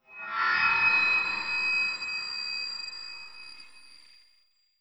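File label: underground ambient; blackforest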